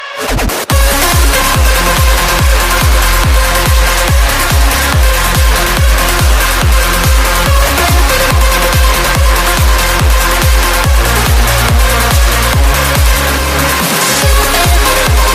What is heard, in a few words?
electro ringtone